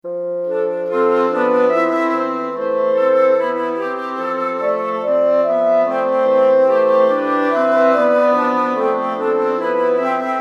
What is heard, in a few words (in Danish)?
klarinet